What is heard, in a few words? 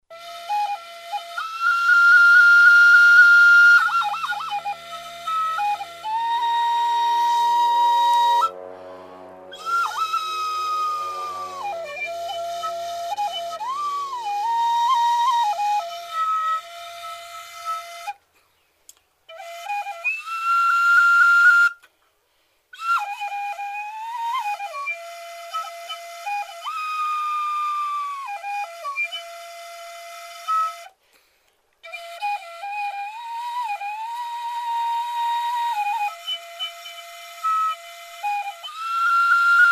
laekeesa flute